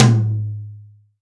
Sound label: high tom g sharp key, Royality free tom drum tuned to the G# note. Loudest frequency: 1155Hz